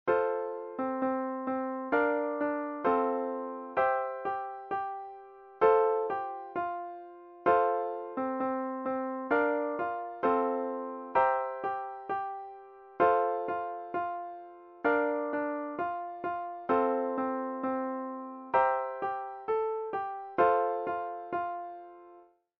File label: baraban; zaez i baraban